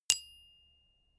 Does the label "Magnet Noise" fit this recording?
No